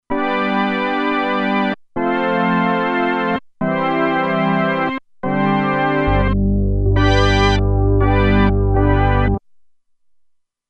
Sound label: Glide Organ, Roland Alpha Juno-2; Factory 2